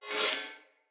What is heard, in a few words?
Split Sword Accent Heavy; Weapons; Player; Swords; Starter